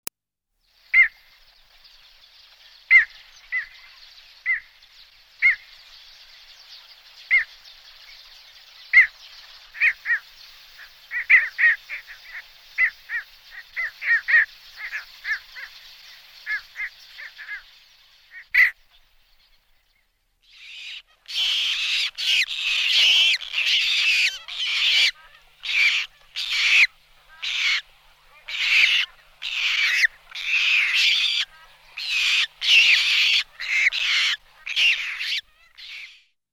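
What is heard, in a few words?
zheltaya caplya golos, squacco heron voice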